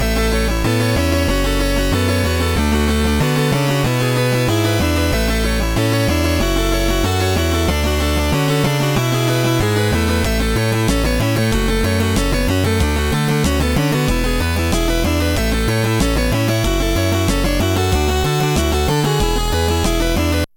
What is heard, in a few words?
old keyboard